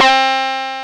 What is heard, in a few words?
D GUIT HI; Back In Time Records; Big Bang; THE ROCK